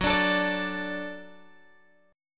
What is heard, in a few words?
F sharp Minor Sharp 5th F sharp Guitar DADGAD; F#m#5 strummed